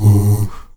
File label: AH OO G#2, STACC. OOS, Africa; Spectrasonics; Heart of Africa 1